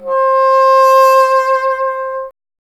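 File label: wind bassoon C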